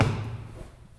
Stairwell Stomp

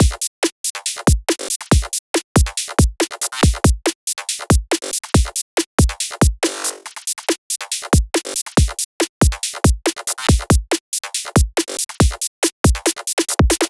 140 full drum loop glitch machine

